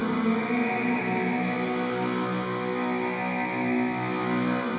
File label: Lap Fuzz FX 100 B, guitar drone samples 100bpm